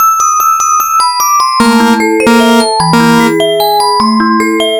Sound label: beeps; C type